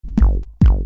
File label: beat